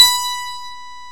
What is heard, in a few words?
HARPSICORD